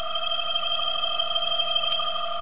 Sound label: ice beam; hedgewars